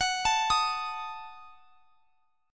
Gb Minor 6th; Gbm6; arpeggiated; x,x,x,23,22,23

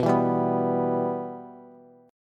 B Suspended 4th Sharp 5th, Bass; strummed; Bsus4#5; JGuitar

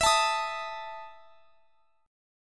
E Major 7th Suspended 4th E; EM7sus4 strummed

